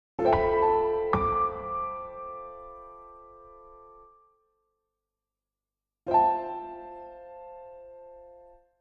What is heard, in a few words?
Nokia Alerts; Windows Phone apps